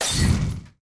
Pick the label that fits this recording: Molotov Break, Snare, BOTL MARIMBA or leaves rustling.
Molotov Break